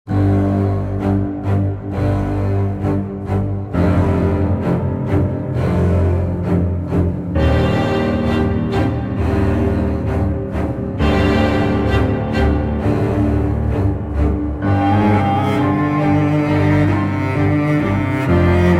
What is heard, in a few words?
Desert Field; Gran Saga